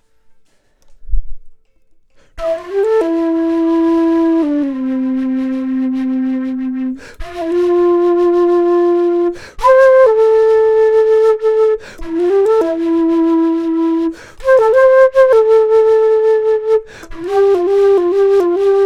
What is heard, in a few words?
flute